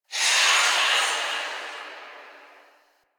Fadeout Stinger; Enemies; Warframe; Entrati Doppelganger

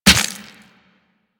Run Bone, Footsteps; Warframe; Operator

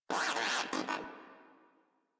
Moa Aggressive Vocal Greeting; Warframe

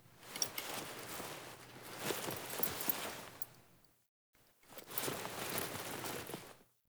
Foley Emote Bow Deep, Clothing; Characters; Player

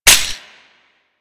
Flux Rifle Reload; Crp Laser Rifle; BE Flux Rifle

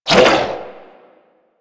Struggle; Warframe; Amalgam Override; Abilities

